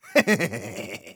laugh evil, de; Engineer

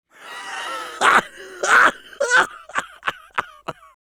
Soldier laugh long, fr; Long gras 1

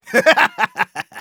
laugh evil, de; Engineer

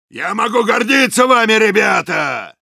cheers, ru; «Я могу гордиться вами, ребята!»

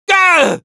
pain severe, es; Sniper

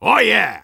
Soldier positive vocalization

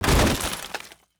coffee bag can drop; soldier

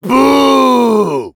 demoman jeers, fr

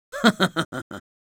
laugh short; Spy; Risa corta 2

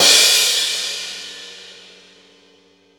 Hitsound percussion

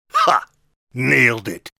badpipes

